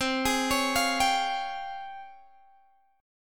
C sharp Major Sharp 11th C

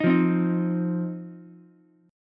C Minor Double Flat 5th C down Ukulele; Cmbb5 strummed; JGuitar

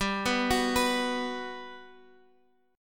G Major 7th, G, down, Guitar, Drop D

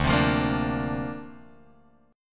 D-Minor 7th Sharp 5th D Guitar Drop D, chord

